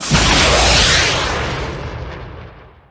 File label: missile launch; drones; garrysmod